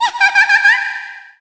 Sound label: Queen Of Fairies Laugh Appear; Queen of Fairies laughing (appearing and vanishing)